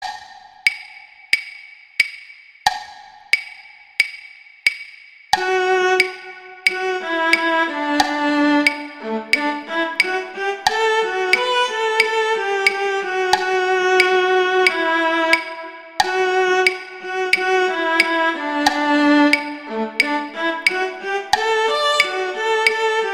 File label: Cello II; Duets; Mozart